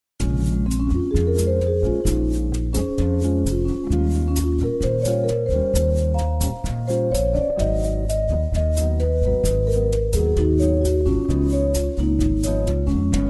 8 Ball Music; Gamepigeon

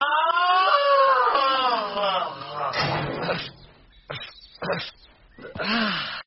screaming; hank hill; Meme

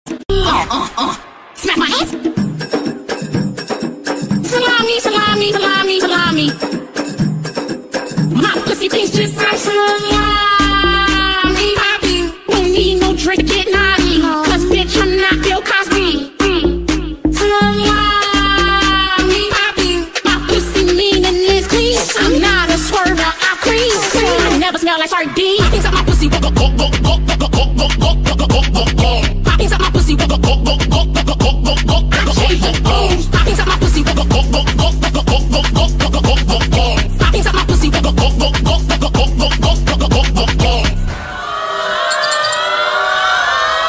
GULP GuLp gUlp

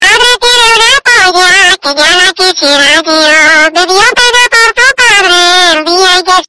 cat

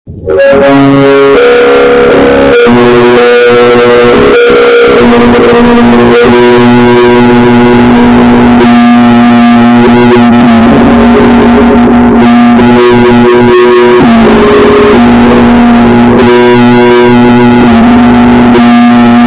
horror music; roblox

